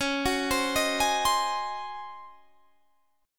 Db Major 7th Suspended 2nd Suspended 4th, Db, Guitar, Standard; 21,21,22,20,21,20; DbM7sus2sus4 arpeggiated; JGuitar